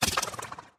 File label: teratoma bounce large